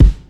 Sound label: boom bap hip hop steel kick drum g key